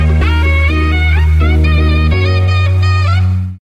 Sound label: SmS, ringtones